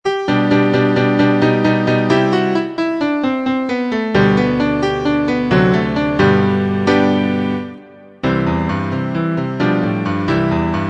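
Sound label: accompaniments, Trumpet in C; Vocalise #5; concone; Rudimentary Transposition Studies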